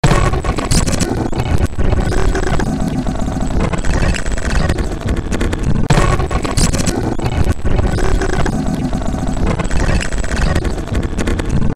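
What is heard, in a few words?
grainy rumble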